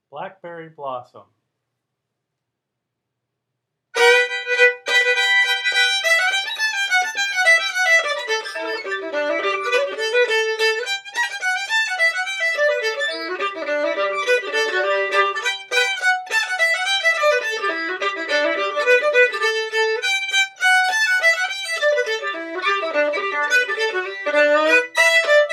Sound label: fiddle; festival tunes